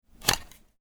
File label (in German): stecker abziehen